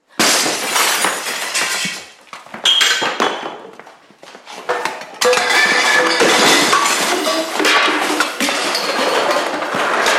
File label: Destruction Interior Various, Smashing up café; various breaking and debris - good for fight scene; Miscellaneous Impact